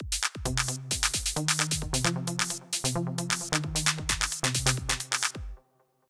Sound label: Trance